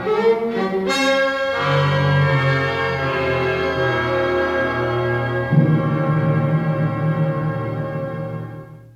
Jingle Answer wrong

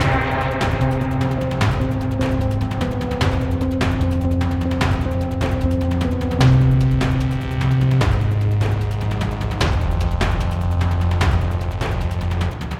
Boss Combat; Griftlands

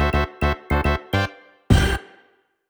Scene Change BGM; SNES

